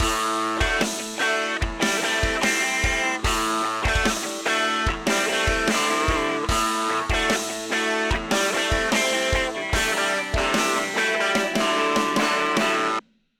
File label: everything chorus with drums